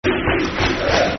Sheep Shaggers Football Chants (Soccer Songs), Cardiff City; fanchants-com